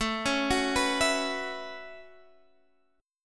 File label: A 6th Add 9th, guitar; A6add9 arpeggiated; x,12,11,11,12,12